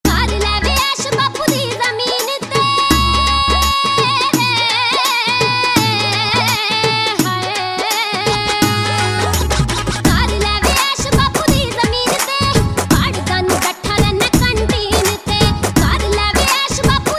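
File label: religious ringtone punjabi; dharmik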